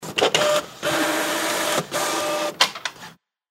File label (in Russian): Принтер пропустил листик